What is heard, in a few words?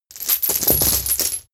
metal chain drop on ground